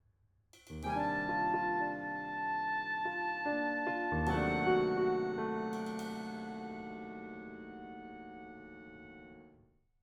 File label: Mysterious